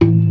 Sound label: ORGAN, C3 PERC; Keith Emerson Signature Series